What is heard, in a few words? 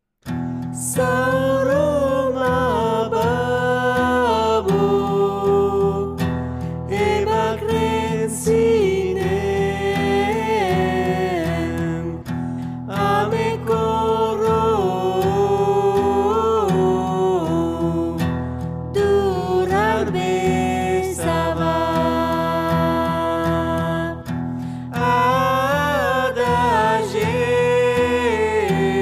lead; Ederlezi; groupevocalpelussin